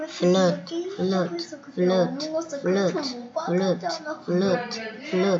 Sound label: flute